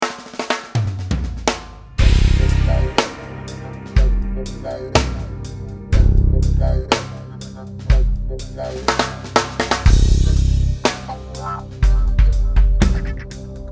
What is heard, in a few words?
Backbeat 122 Stop N Go